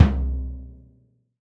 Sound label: bassdrum oh, gretsch kit